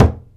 BODHRAN, ETHNIC PER; AKAI S-Series CD-ROM Sound Library VOL-5

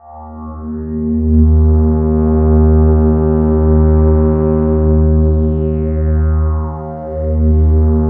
PAD, Drum & Bass, 150 BPM; Ueberschall; Drum & Bass Resonance

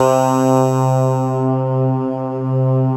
SYN 800A, Roland JD 800; Sound & Vision - Gigapack I CD 2; JD 800/990

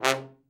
trombone; Best Service; Killer Horns

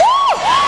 vox special

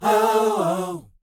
SHOO BE, Vocal R&B Dance

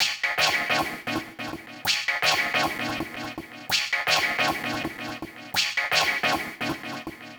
Chd Fx Loop 130; Equipped Music; Loungin House

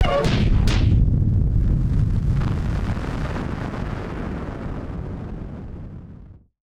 Firework FX; musicradar; bomb factory

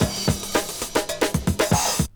break; Zero G - Man Machine

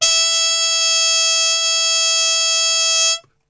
E 3, Brass; Best Service; Brass Super Section; TRP HARMUTE; 90 s Sample CDs

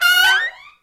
SECT BRASS FX

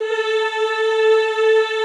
Classical Choir, A3; Best Service; Extended Classical Choir

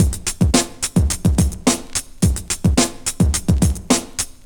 LOP, Drum Loops; Big Fish Audio; Prosonus 500 Drum Loops

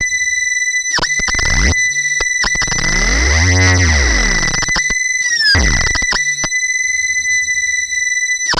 analog, special fx twisted; USB Soundscan; AKAI

